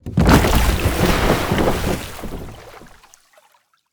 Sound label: wooden boat sway sharp